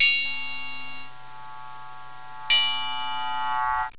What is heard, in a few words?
piano